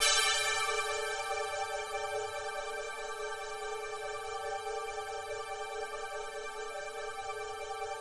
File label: 120 Pad A, Keys, Nu Jazz House; PowerFX